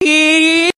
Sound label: eee, Voice